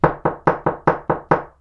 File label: being knocked